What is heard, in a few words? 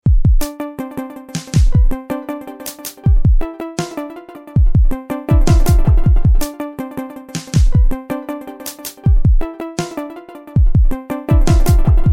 soft ring